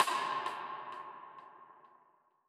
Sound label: percussion, percusson samples; RockScience